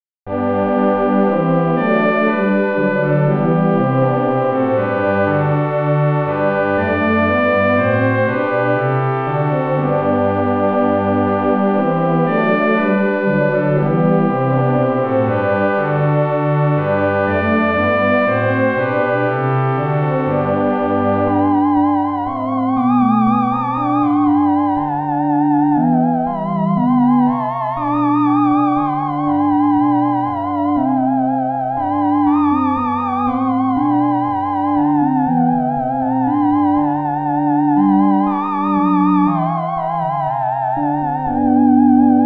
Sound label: PolyM Bach Choral